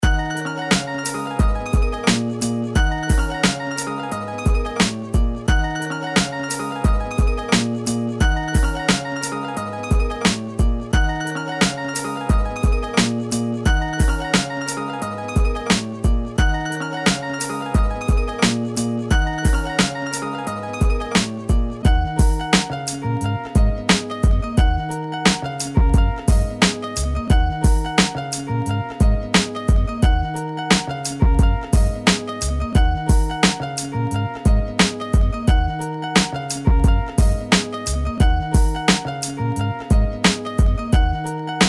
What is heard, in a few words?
instrumental